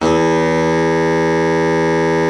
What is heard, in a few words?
BARITON, BARITON SAX